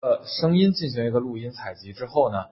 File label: recorder